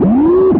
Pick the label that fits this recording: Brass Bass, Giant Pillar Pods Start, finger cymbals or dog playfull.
dog playfull